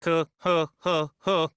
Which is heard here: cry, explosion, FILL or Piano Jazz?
cry